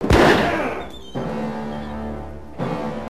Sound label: death; singe